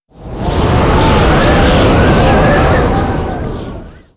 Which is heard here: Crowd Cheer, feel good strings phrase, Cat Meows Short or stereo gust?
stereo gust